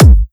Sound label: drum hit normal; osu; hitsounds; deadcodehs